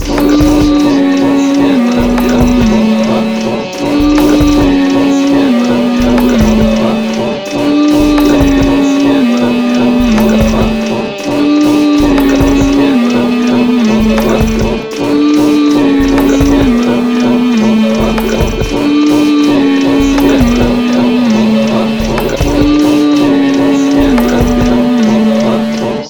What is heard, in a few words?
beat